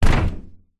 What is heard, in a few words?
footsteps feet jump land metal external platform floor; foley; zapsplat